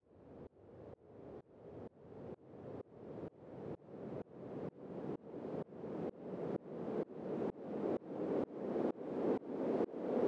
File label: Very Long Sweep, White Noise